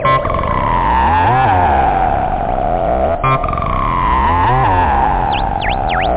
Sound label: loop techno; da capo vol1; instruments